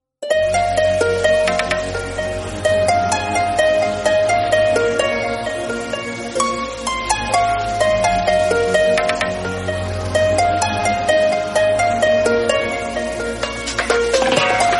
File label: flying high background music tone ringtone